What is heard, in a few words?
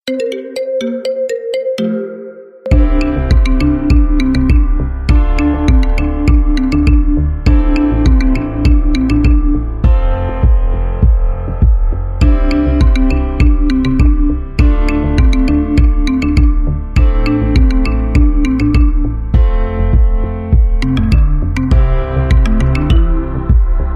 marimba remix